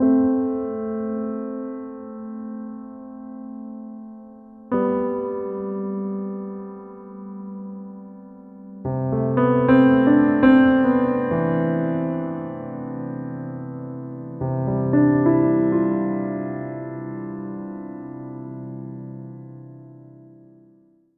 Piano; Sonic Foundry (Sony Creative Software); Slow Silhouette

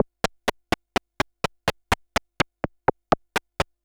SH101 Clocked LFO Rhythm (125BPM)

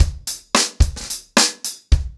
Hip Hop 110BPM, acoustic drums drumloops

